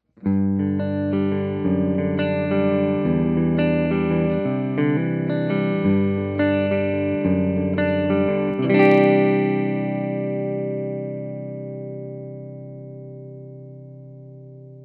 double cut humbucker clean, guitars

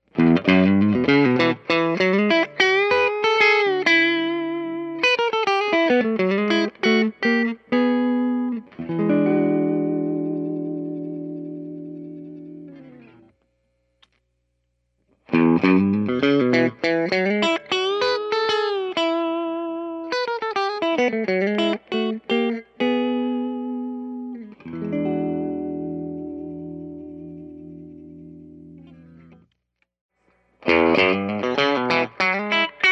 convertible lipstick distortion, guitar

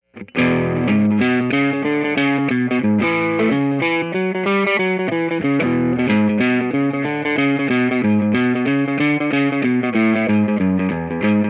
distortion, guitars; roxxy

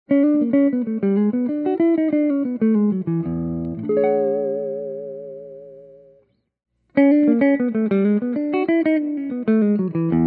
jazz clean, guitars; roxxy

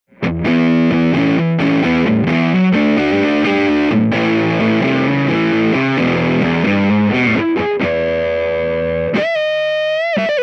p90 distortion; axxis

